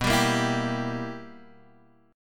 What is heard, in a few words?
B Major 7th Suspended 4th Sharp 5th B down Guitar Drop D